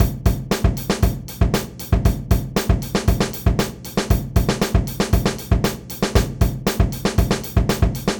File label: 117bpm; GroovePizza